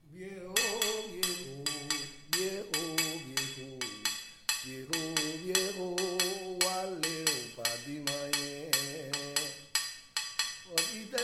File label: merengue